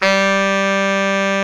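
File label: SAX Baritone Sax G 3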